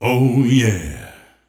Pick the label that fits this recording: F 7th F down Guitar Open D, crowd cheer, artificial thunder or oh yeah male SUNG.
oh yeah male SUNG